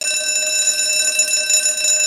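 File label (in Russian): охранная сигнализация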